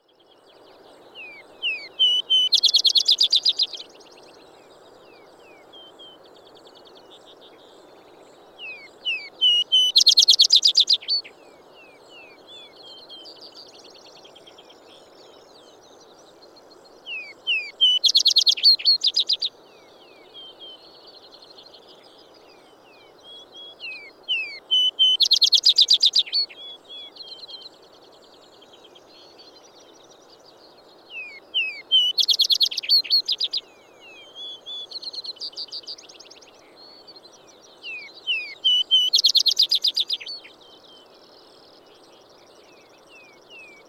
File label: Vesper Sparrow